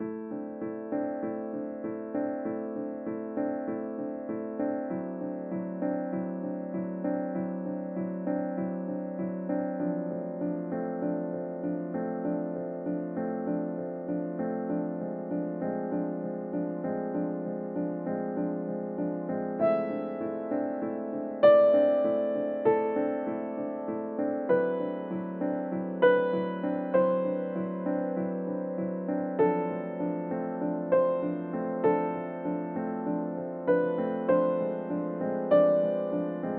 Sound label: piano lesson